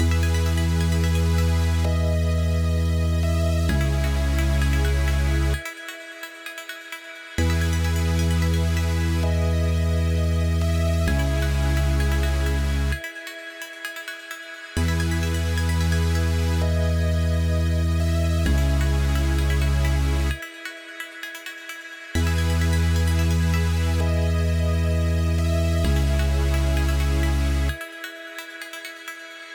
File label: Melodic Synth Edm